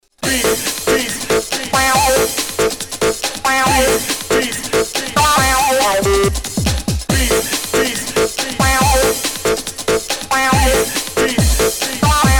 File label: funky beats; Музыкальное сопровождение хоккейных матчей